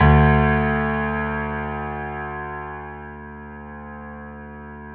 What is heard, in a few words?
PIANO FF D2, STEINWAY; Greytsounds; Philip Wolfe David Crozier's Saxophones; GR8 STEINWAY